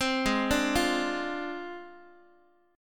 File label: Ab 6th Flat 5th Ab, Guitar; x,x,3,6,6,4; arpeggiated